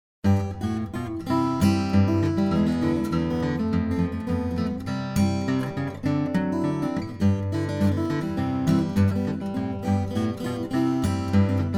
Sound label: rag, fingerstyle; victory rag